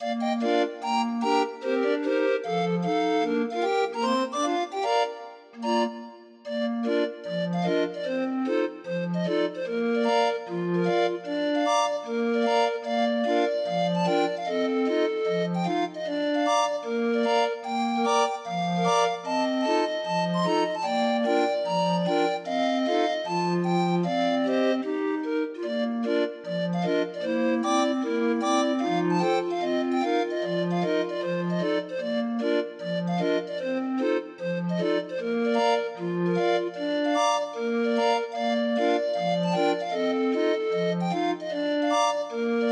Grind Organ

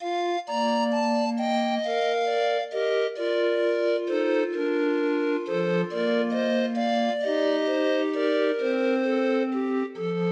Holsworthy Church Bells, Grind Organ; Arrangement by Stephen Simpson.

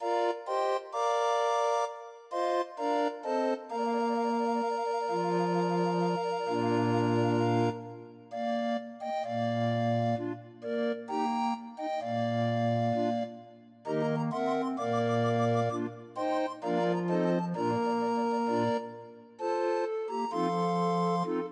Rock of Ages, 31 note MIDI, Grind Organ; Demo of 31 note MIDI file

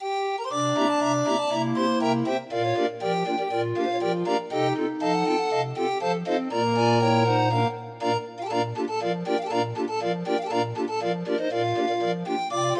31 note, Grind Organ

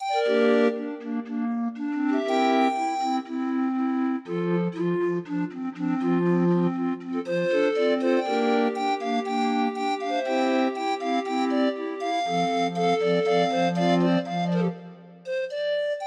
Grind Organ

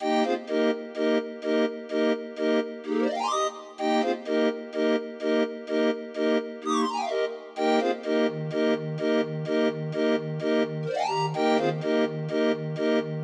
Pilfinger Dansen 31 note, Grind Organ; Demo of 31 note MIDI file

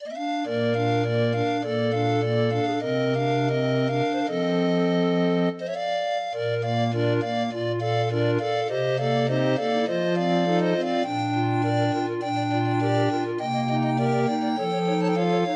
31 note, Grind Organ

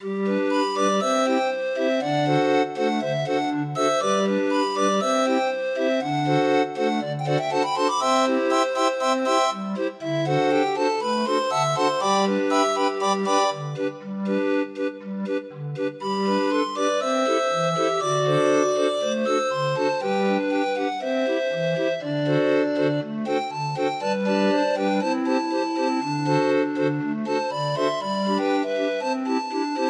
25 note, Grind Organ

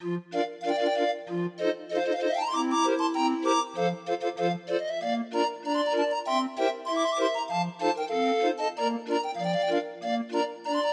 Swiss Polka, Grind Organ

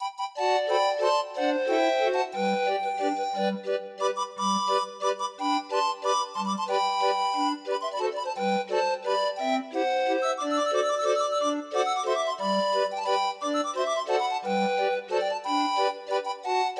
Grind Organ; Black Velvet Band

